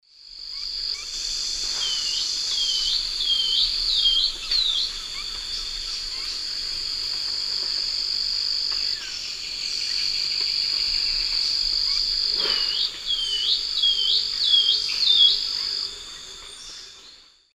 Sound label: Myrmotherula longipennis zimmeri, song; S. America; Eastern Lowlands